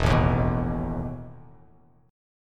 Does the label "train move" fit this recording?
No